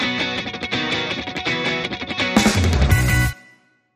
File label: Sting